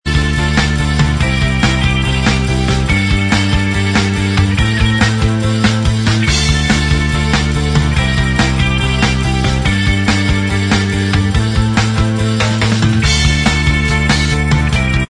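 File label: instrumental, backing track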